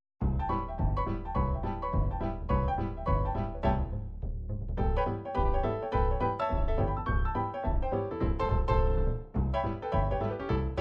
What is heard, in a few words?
swing trad